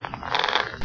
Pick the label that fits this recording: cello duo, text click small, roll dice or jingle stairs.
roll dice